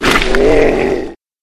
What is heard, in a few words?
monster toxic death